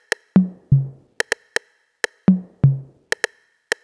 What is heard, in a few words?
drum body percussion 125, Classic Chicago House Drum Loops